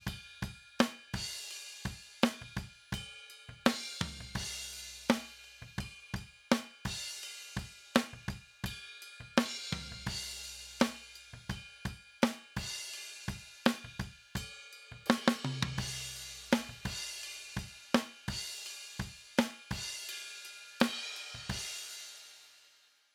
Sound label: Drums Overheads